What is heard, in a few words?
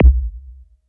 MKS80 BD; DANCE KIT